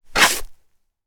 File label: Foot Scuff Hard Floor Heavy